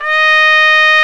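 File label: BRA TRUMPE, trumpet, brass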